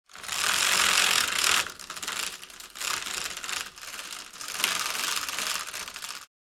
Fabric venetian blind roll down

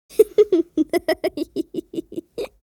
Boy child laugh chuckle

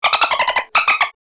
Dolphin chirps and clicks

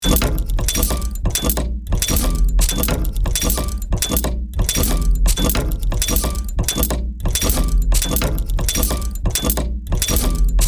Heavy industrial machine running loop; Industrial Machinery; gfxsounds

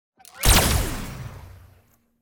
Sci-fi weapon neutron cannon hitting

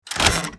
Casino slot machine reel start

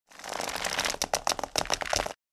Military rope tightening; Military Equipment

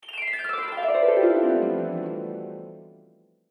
Dream harp

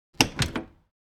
Old car door opening

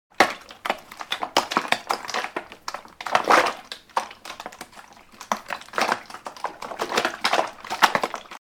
Mixing paint small can with metal stick

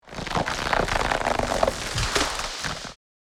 Nature magic spell entangling roots

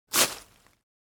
Pile of leaves rake impact hit; garden

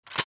Winchester 12 pump series

